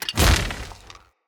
Charged Punch Whoosh; Weapons; Unarmed

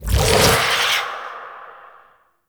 Alt Shot Whispers; weapons; Noctua

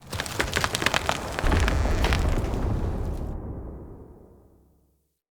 Root Cave Root Movement; Ambience; Neathuns Root Cave

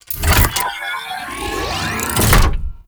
Railjack Turret Harness Side Equip; Warframe; Vehicles

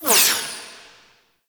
Laser Fire Whiz By; Enemies; Weapons; Warframe; Tau; Sentient Dactolyst

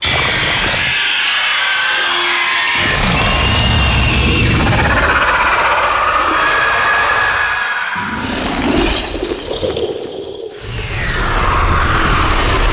Death Vocal; Cinematics; Archon Death; Archon Nira

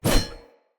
Spear Whoosh; Weapons; Player

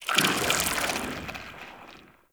Infested Tendril Tree Creaks; Ambience; Props; Warframe; Infested Eris

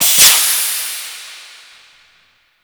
Tenno Animal Foundry Stasis Steam; Liset Machines

